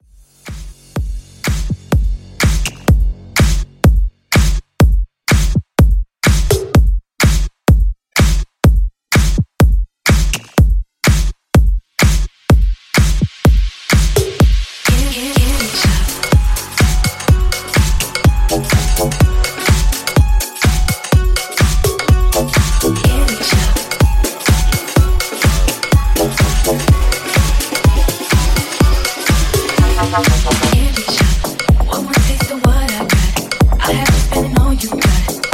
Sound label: Bootleg House 125 Bpm Clean